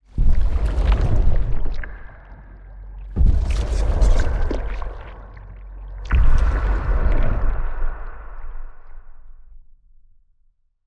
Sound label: blood pulse; rifts; Stellaris; astral planes